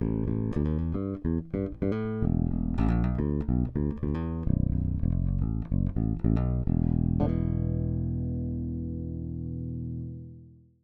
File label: Fralin Big Single Active Bridge, guitar